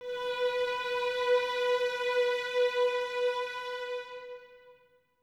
violins B 4, String Ensembles, 23 Violins; Miroslav Vitous

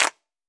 CLAP

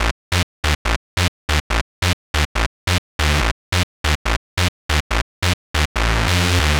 Lead D, club techno lead loops

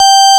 KEY XFM, Organs; Club-50 - Foundations Roland

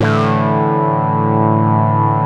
AQUA TARK, Synth; InVision Interactive; Keith Emerson; The Most Dangerous Synth and Organ; SYNTH LAYER

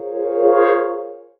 Fm Dub Chord

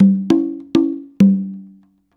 CONGA, Modern Percussion Loops

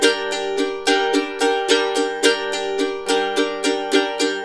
RIFF, Blues Guitar Samples; ILIO - Fretworks

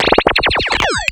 Laser Fast

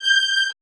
G5, Orchestra; AKAI Advance Orchestra Upgrade 97 Vol.1

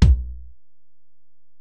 Ac BD, Alesis DM-PRO; 300 Drum Machines

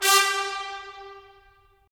BRS, Tps Stacc; Roland - LA Composer vol. 4

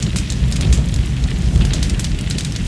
fireplace; jazz jackrabbit 2

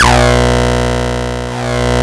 C3, synth; 90 s Sample CDs; NiceBeats; SynthLine VOL-29

